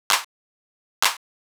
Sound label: Clap, EDM; Sonic Foundry (Sony Creative Software); Afterhours EDM; Ibiza Underground